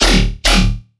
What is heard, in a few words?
Riddim Bass Hit E